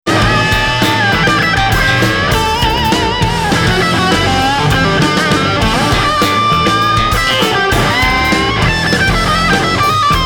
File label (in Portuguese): Blue Tone Solo do Riff, guitar